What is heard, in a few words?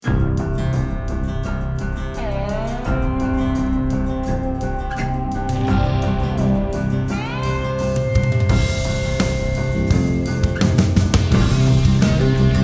low tech combat; Element: Space; PS4